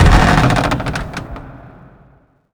land hard wood

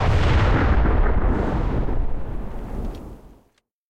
20 23mm tail far, guns tanks; War Thunder

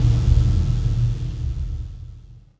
mohole beam end

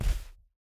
bear footstep sand hard; Rust

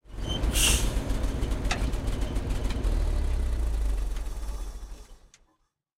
m1 engine int stop, tanks; engines; War Thunder

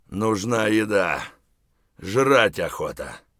tired, voice; Escape from Tarkov

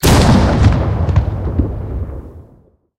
cannon 76mm qf17 shot, tanks; cannons; War Thunder

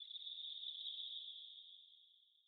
cave insect trill; Rust

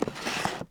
footscrape; Rust

